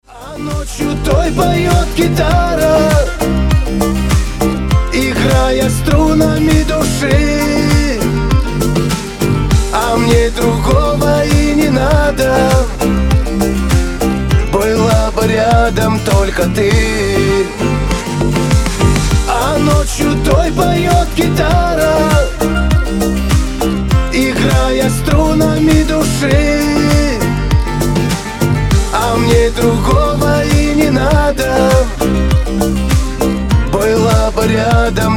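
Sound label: gitara, guitar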